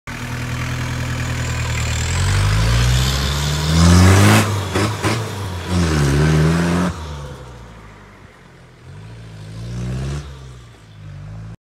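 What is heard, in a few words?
Pajero I 2.5 straight pipe brutal Exhaust sound; mitsubishi montero 2.5 td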